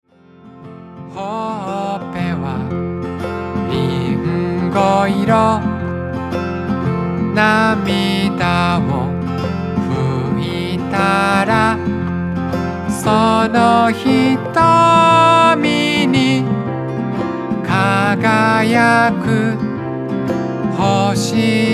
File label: Folk Dance